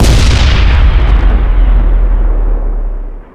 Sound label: gauss hit, weapon